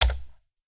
key; ropefall3

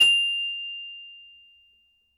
start; word link2

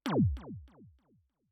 laser; drawing space

